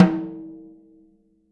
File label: SN OFF, snares